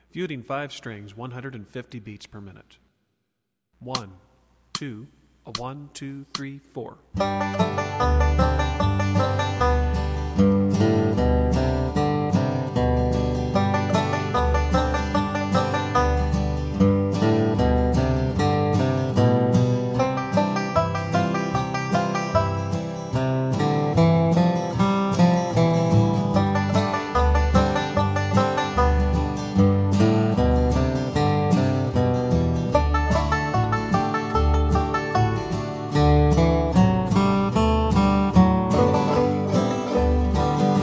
150bpm Solo, banjo

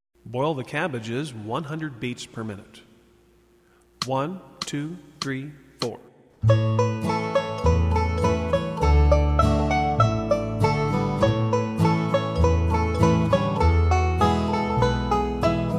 100bpm Solo, banjo; Boil The Cabbages

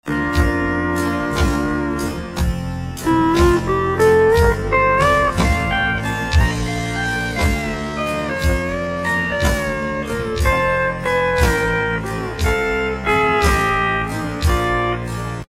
chop very slow, e9th

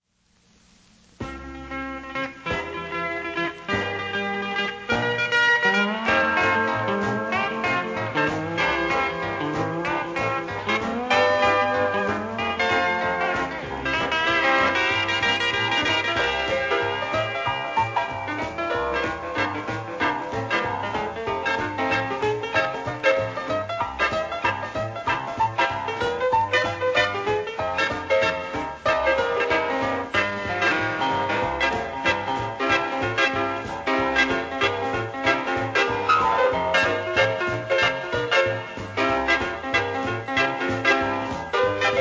twin steel boogie; twin steel guitars

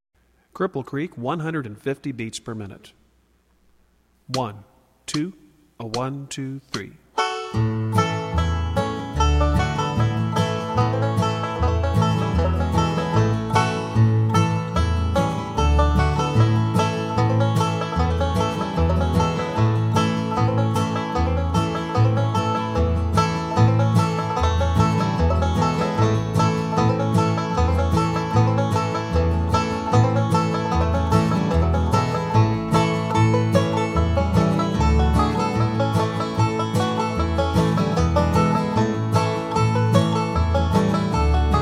150bpm Solos, banjo; Cripple Creek

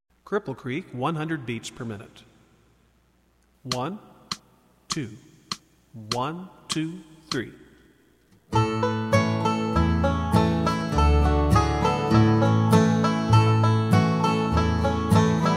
Cripple Creek 100bpm Solo, banjo

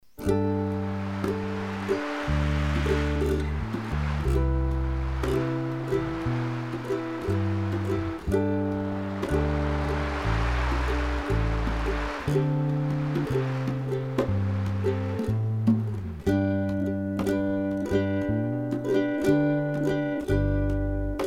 waves ukulele

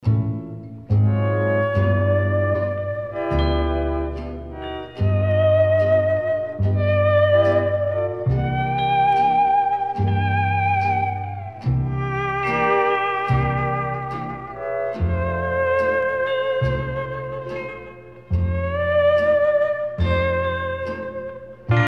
violin steel

